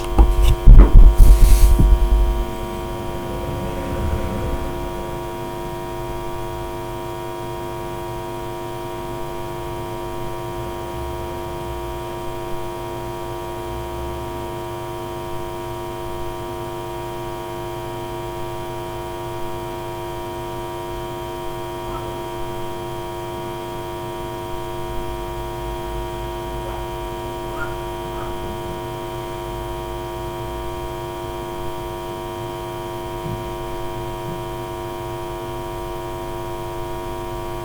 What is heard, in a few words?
recording in front of tv with mic buzz